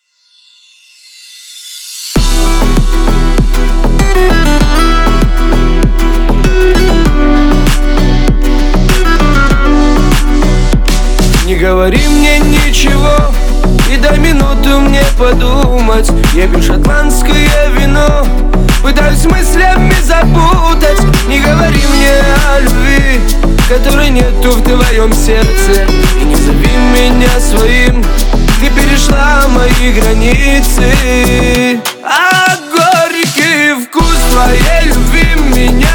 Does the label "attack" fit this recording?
No